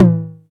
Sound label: tom drum single hit e key, Royality free tom drum single hit tuned to the E note; tom drum samples